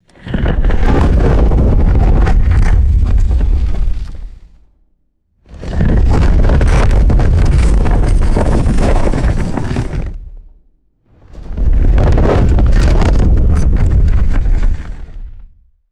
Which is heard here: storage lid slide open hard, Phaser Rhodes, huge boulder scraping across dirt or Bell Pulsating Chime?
huge boulder scraping across dirt